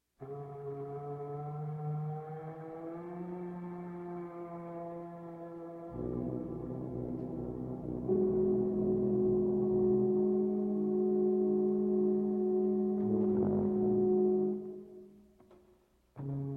Tuba Quartet; Five Moods for Tuba Quartet; Mvmt III

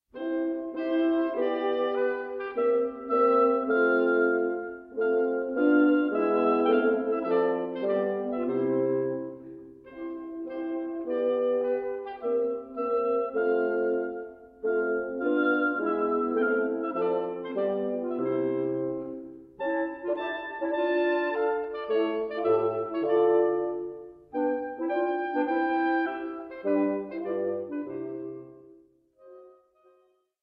Quintet; Mvmt II

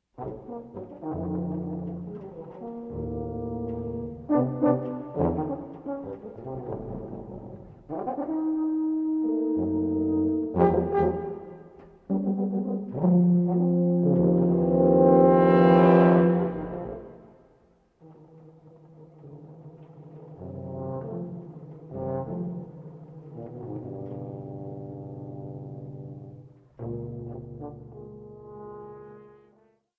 Tuba Quartet; Five Moods for Tuba Quartet; Mvmt II

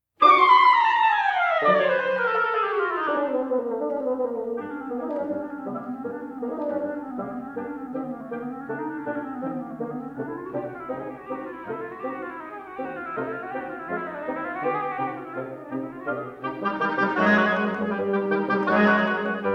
Wind Quintet; Westwood Wind Quintet; Rimsky-Korsakov; Flight of the Bumble-Bee

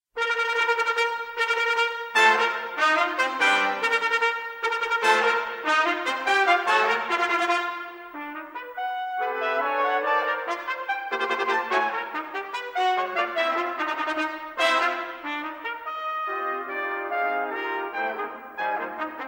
Music for Five Trumpets Fanfare; CRYSTAL RECORDS; Reynolds

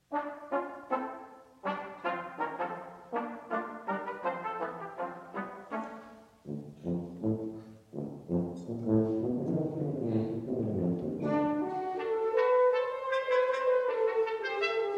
Brass Quintet Allegro molto; Second Brass Quintet

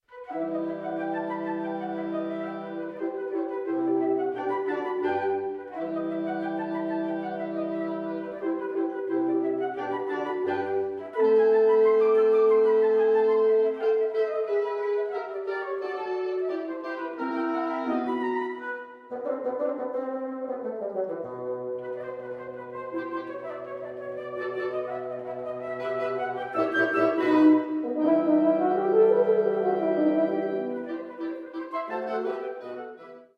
Quintet; in E Minor, op 88, no.1; Mvt 4

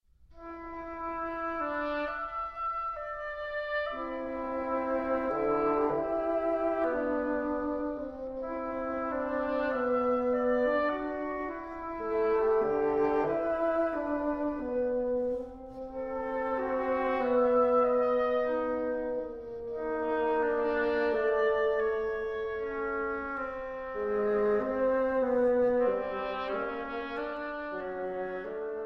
Trio Adagio; Francaix

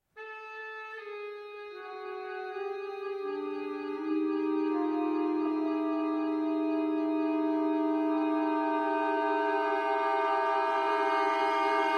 Woodwind Quintet

